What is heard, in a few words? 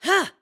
Throw; Link throwing an object; BOTW; Breath of the Wild